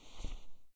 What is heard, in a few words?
USP Draw; zps; Weapons; Firearms